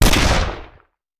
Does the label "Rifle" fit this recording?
Yes